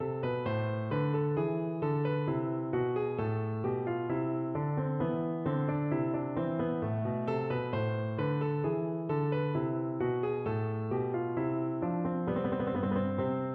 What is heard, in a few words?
bach bourree piano